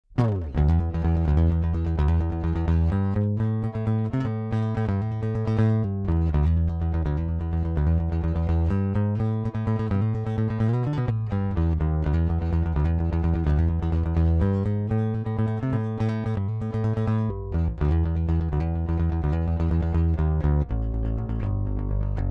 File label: P3110 DI Rickenbacker